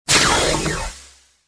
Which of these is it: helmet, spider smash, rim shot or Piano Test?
helmet